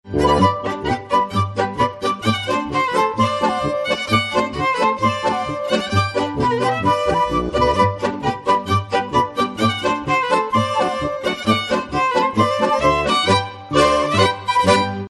Polka; Polka Von Der Pack

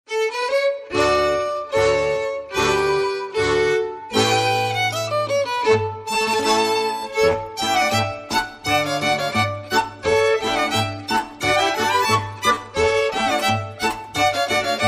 aufzugsmarsch; aufzugsmarsch aus noe

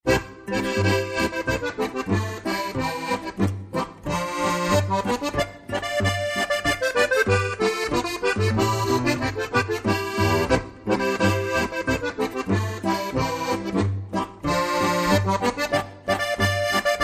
Boarischer; angerle boarischer

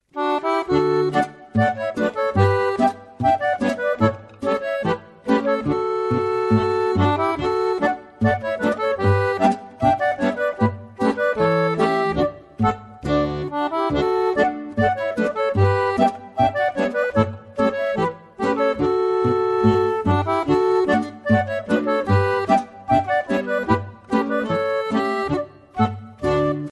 Schottischer; Hollaredl Schottischer

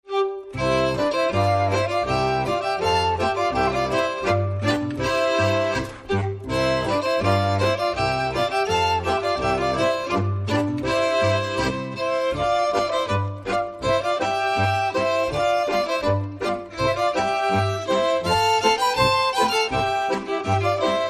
marsch; auf zur godn marsch

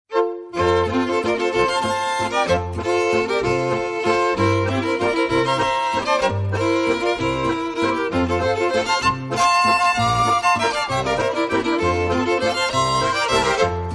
walzer; kathrini walzer

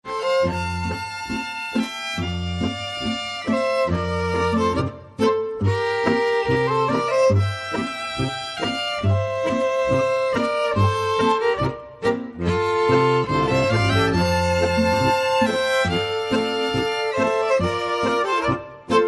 Boarischer; Fensterstock Boarischer

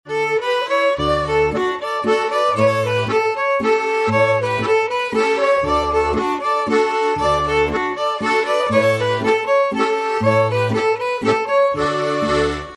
Landler; Landler aus Neustadtl

